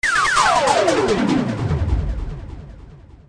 Echo Laser Lo; Millennium Gold 2000; Crackanoid

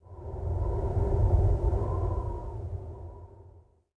WIND, wind; zones

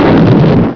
Enemy Hit; Missions of the Reliant!

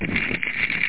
tree; dosgames; labyrint

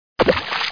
WATSHOOT; CHASM

